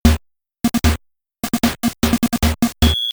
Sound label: Start Music